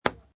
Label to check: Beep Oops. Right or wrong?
Wrong